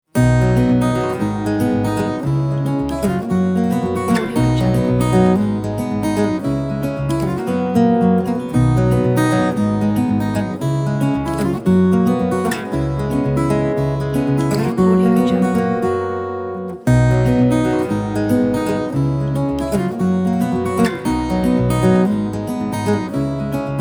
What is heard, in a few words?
acoustic guitar